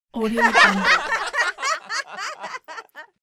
family group funny laughter

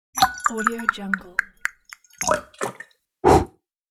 drink vodka

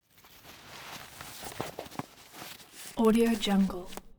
object umbrella telescopic close velcro

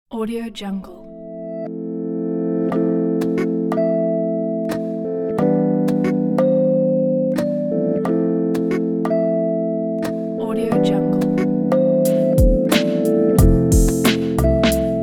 electro relaxation

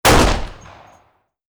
9 mm semi automatic beretta pistol single shot; sound ideas

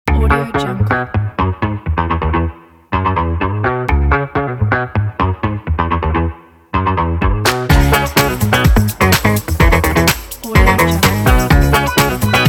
be funky; yula-music

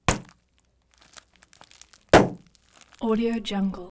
plastic bag pop potato chip bag crinkle; prosoundfx

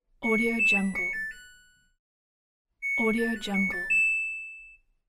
interface buttons or beep alert

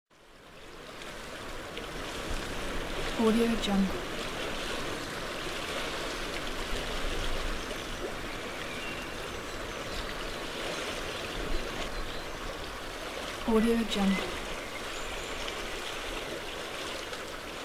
lake in the forest

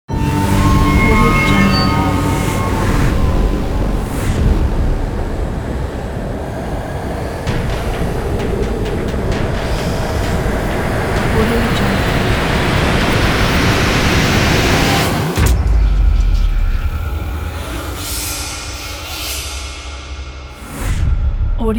dark suspense logo

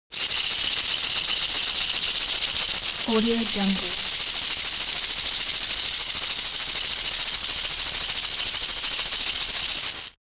object shaker wood rough rattle fast hard; smartsoundfx

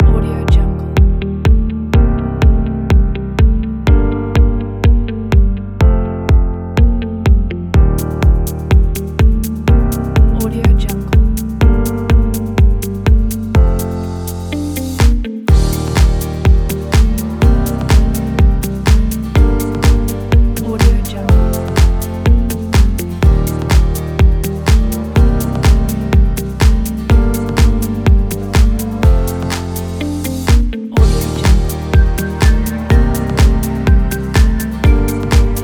commercial background

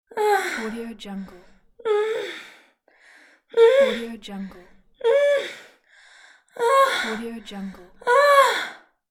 female orgasm

